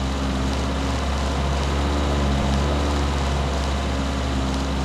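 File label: car drive, chicago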